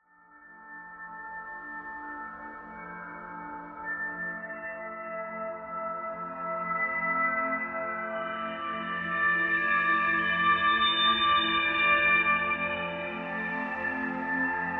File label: Space Whales; The Spatials: Galactology